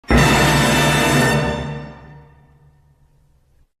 loud chord

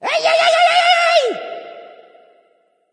woohoo; amc

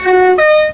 class 158 horn

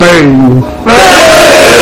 boost; beanception

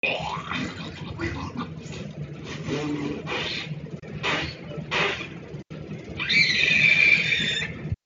burp

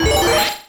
speedup; undertale

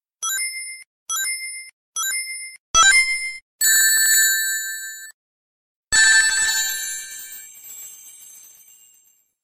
rings; sonic

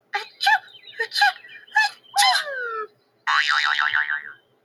sneezes

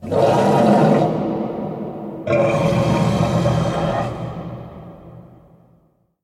lockjaw growl; trtf 5 tortured

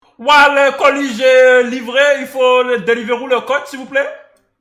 waaah; melchior; le colis

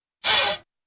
wooden scraping squeak

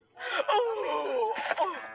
mother fainting sound

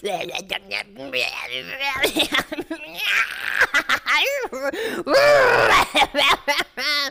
possessed

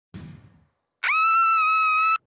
octopus screaming; betty the octopus

